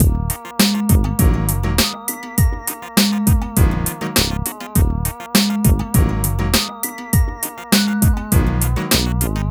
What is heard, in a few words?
Full Loop, Latin Street; maudio